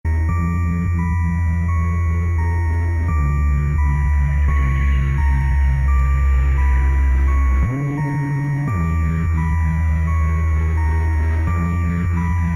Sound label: win music